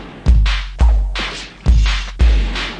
lofi karate beat, 171 bpm; 161 to 180 bpm

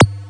Syn Dr, Overloaded Synthetic Drums; style kits

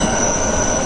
subway sound; UNUSUAL KITS and FX; Subway Sound Construction Kit

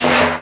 arabic perc, Arabic Percussion Kit

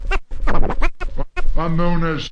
turntable known as, Scratching and Turntablism

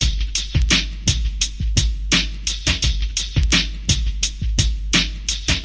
85 bpm, frosty chiller, drum loops and breaks

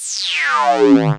bandpass zap down; Basses

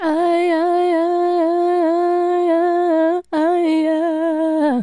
fem vox aii aii aii aii yuh, FEMALE SINGING; VOCALS and SPOKEN WORD

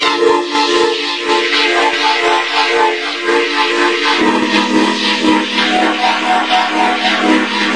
breathy 2 chords synthline

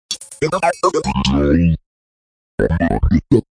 vocal blurpbeat; REMIXABLE COLLECTIONS; Kraddy Collection

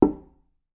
African Wooden Drum High; ETHNIC and WORLD PERCUSSION

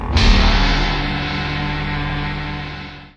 jazz fade hit; Melodic Stabs and Hits

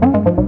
128 arp loop; SYNTH AND ELECTRONIC LOOPS

